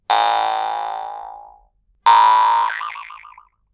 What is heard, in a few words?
no buzz deflected sound